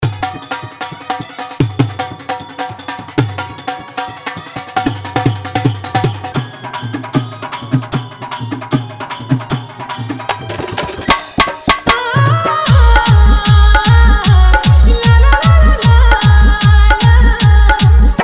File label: Bhojpuri Love Song